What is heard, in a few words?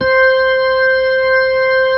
B-3, Keyboards